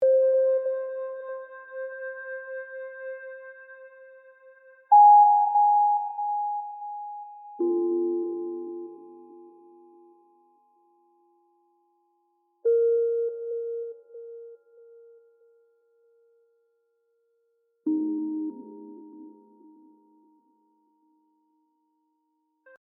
Sad music; Among the Sleep

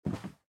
land wood

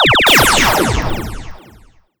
Finish Hit; Nicktoons Nitro; arcade

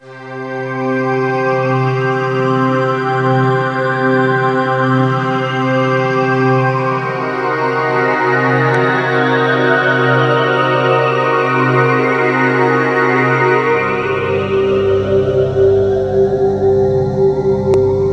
Inshop; Discworld II Missing Presumed; MS-DOS

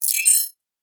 Additional Weapon Sounds

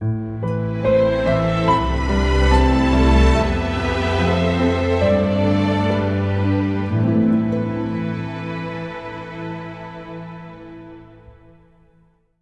Defeat; Inazuma Eleven GO Chrono Stones Neppu Raimei

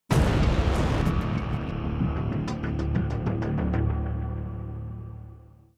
190bpm; Legends of Pegasus; windows gamerip 2012

